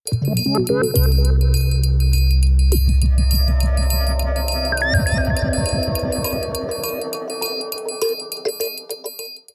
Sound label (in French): Futur link; Jingles medley